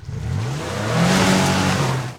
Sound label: Auto Tires Peelout Mud; Automobiles